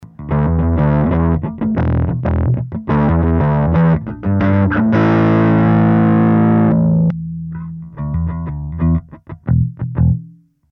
fuzz bright

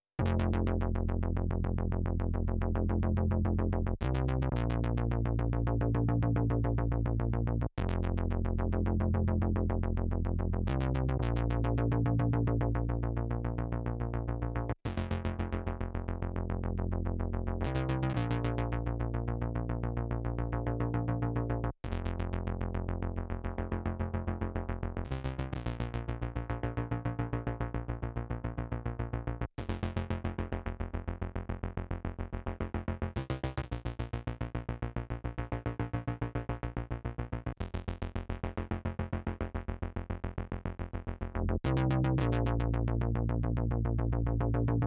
Trem Synth

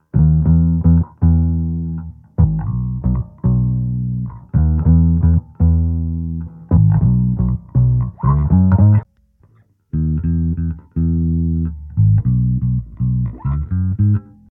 BASS Double Bass

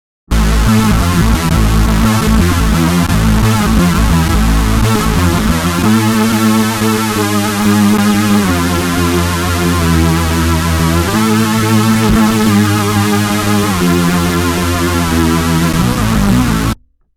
BASS Super Saw

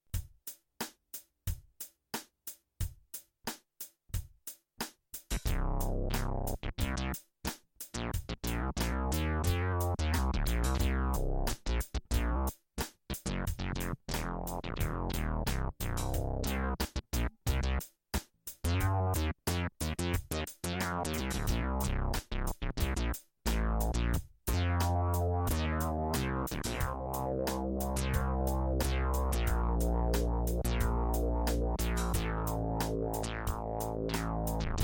Driven, bass

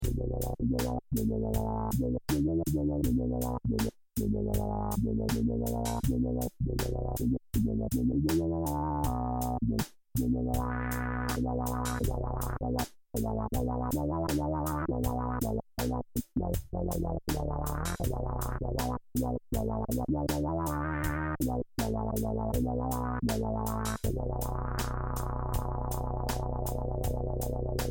Keyboard Smash

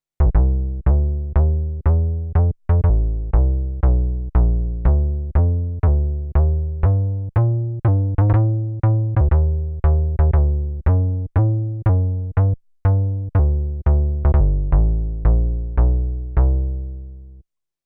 Upright Bass

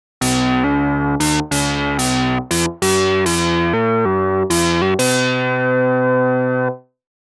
Glassy

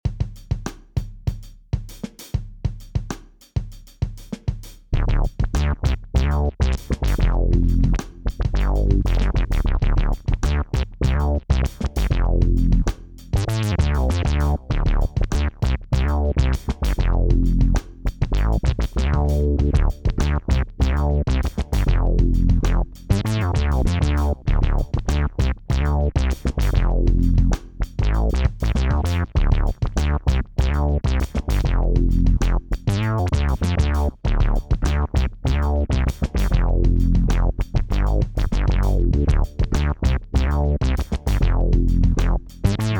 Bass Delay

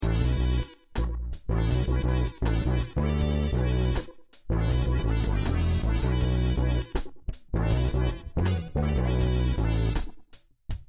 Blipping driven bass roll off tone

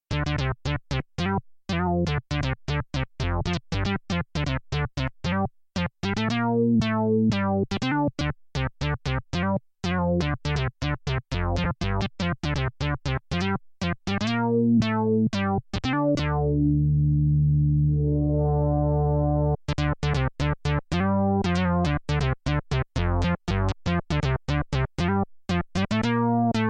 Single Square Mouthful

